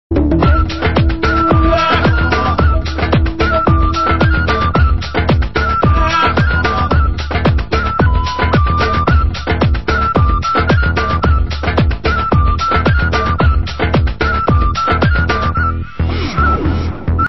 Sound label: Nice Flute